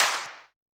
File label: clap; LiLA'c Records PsyTrance Sounds 01; LRP1 Clap4